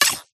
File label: Objects Items Interactions Menus; PMSFX